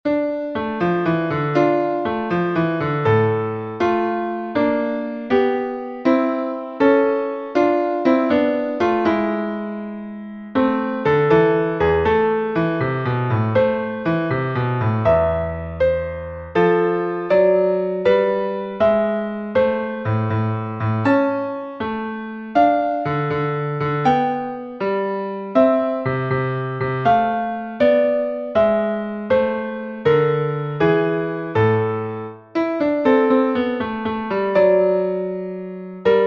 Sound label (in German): Partimento in d-Moll; F. Durante